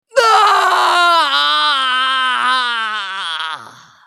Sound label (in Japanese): スカウト男 死亡